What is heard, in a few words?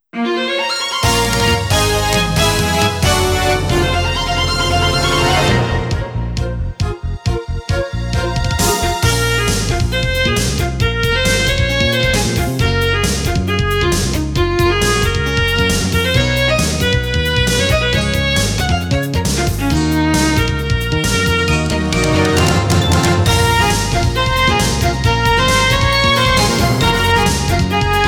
Normal Battle; Atelier Iris Eternal Mana 2 Soundtrack Book; Oh Sword, Shine